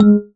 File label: marimba raincoat; ゆめ2っき